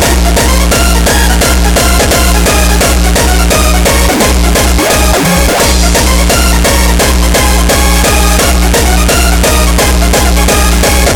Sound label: Loop Bass Edition